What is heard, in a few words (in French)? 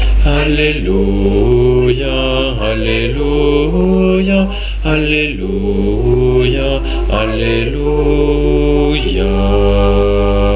Alleluia basse; mariage